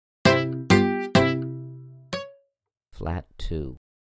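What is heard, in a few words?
Flat6 Acoustic 12 String Steel Muted Guitar Major Degree Name; ear training instrumental color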